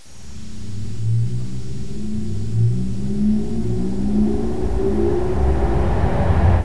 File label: hum; sven; caged; ambience